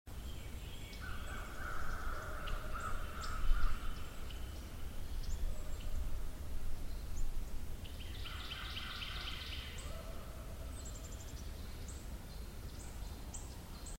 whoops; Louisiana Whoops; These were recorded on the morning of February 1st 2009, in one of our research areas in Louisiana; Sasquatch

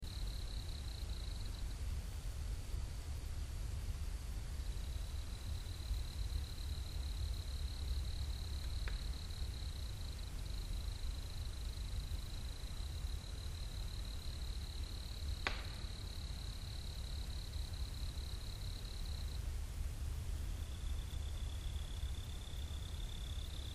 wood knocks

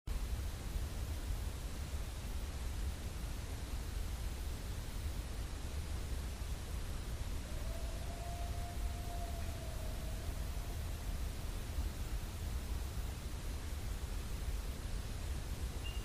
howl; Unidentified Howl 22:51hrs 3-13-10; Bigfoot Research

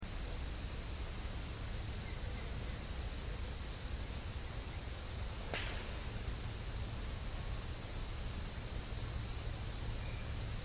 wood knocks; This was recorded on private property in East Texas, date for this was May 4th, 2008.

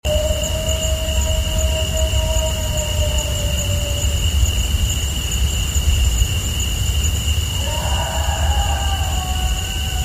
drop coyote response; Dropbox Vocal Capture 10-7-11

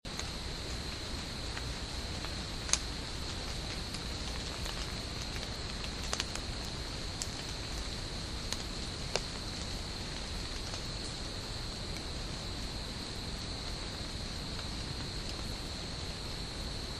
breathing

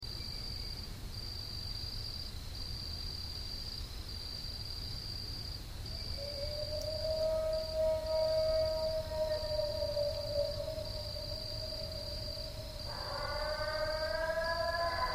blast response, Possible Bigfoot vocalizations; Unknown Vocal; This was Recorded in East Texas in May 2008, this one is probably canid in origin

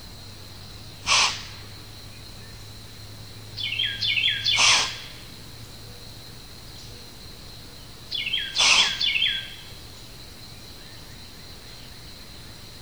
deer blows

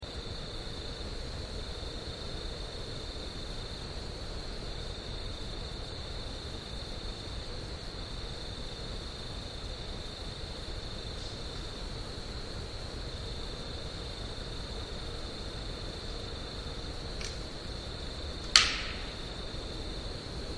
rock thrown

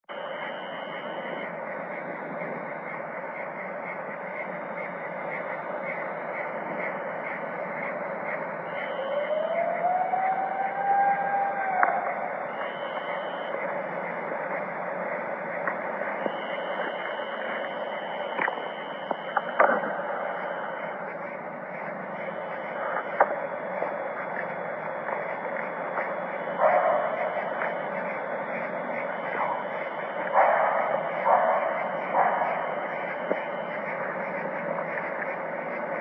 howls; Unknown Vocal; This was recorded in August 2006 and has several vocals in it, the barks and the second vocal are canid in origin

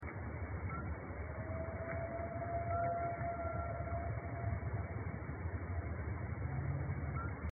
first howl filtered; Cleaned copy of the 22:51hrs vocal

